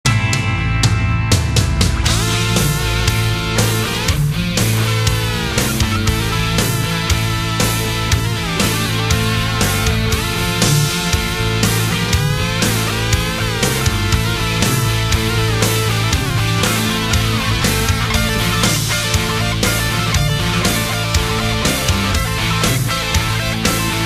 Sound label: Solo